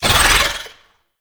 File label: Wall Climb Cast Vocal; Warframe; Oraxia